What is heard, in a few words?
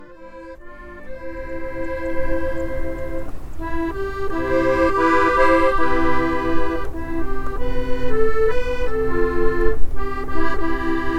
Accord; Piran